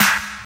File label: Steel Snare Drum Sound F# Key, acoustic snare sound tuned to the F# note